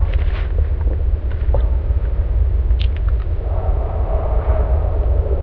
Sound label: ambience, atmosphere; source engine; hl2; video games